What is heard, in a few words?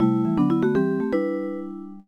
Chime; little mermaid the ariel s undersea adventure nintendo ds